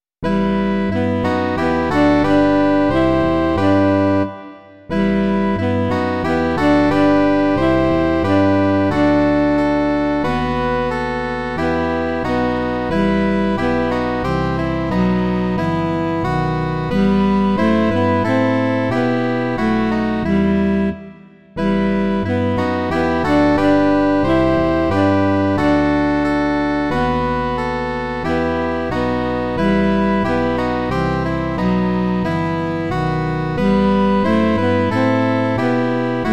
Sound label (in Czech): trio saxofonů a baskytara; Aj, již se nám den nachýlil; Imaginativní verze